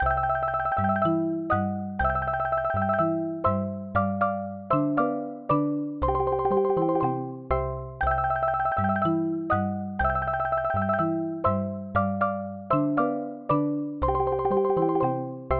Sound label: marimba; Arturia V Collection 11